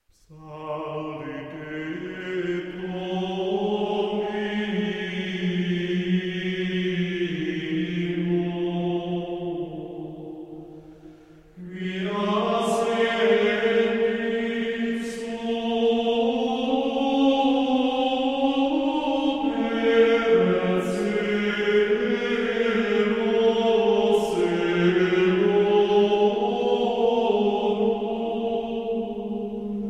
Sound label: Choralschola Communio Psallite Domino, Gregorian chant